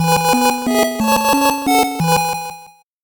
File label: 8bit jingle normal